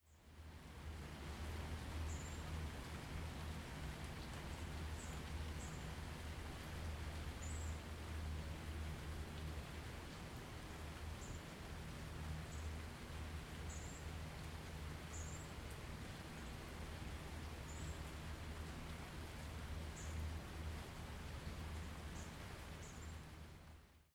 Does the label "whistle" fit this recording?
No